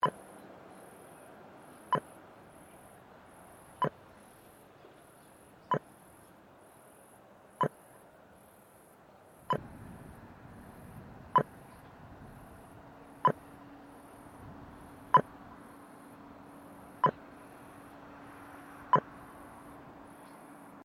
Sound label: Traffic Lights Australia Pedestrian Wait Signal Loop